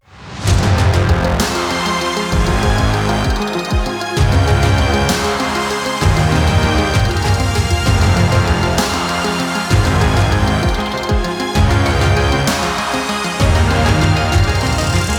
Orch Buss Full

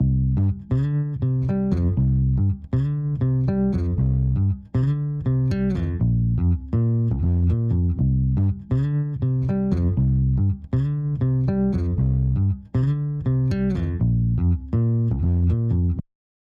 Bass

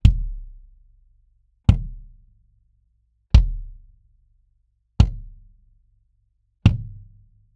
Kick Mic Test

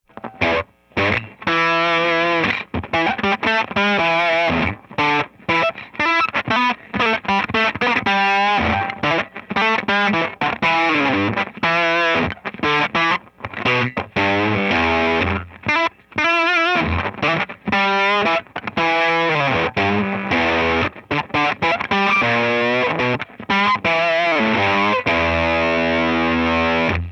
mkI 57 LP blues

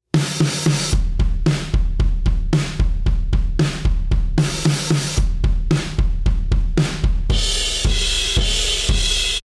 Drums with Devil Loc

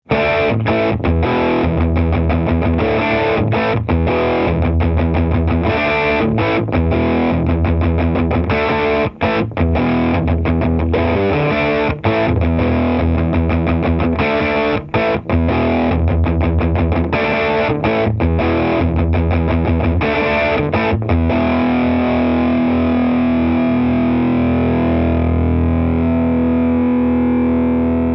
LP

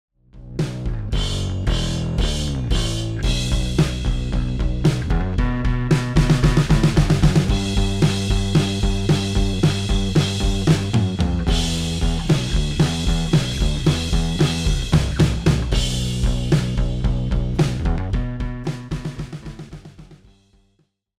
Bass with More Decapitator

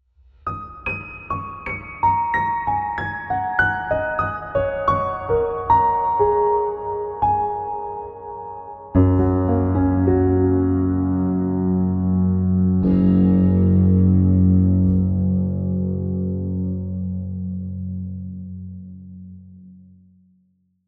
piano and guitar concert

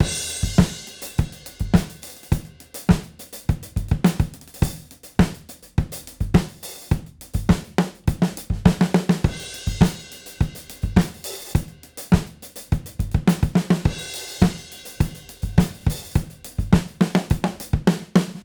Auto Align AFTER

